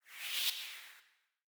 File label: Sci-fi Swoosh